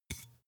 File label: glass bottle handstroke soft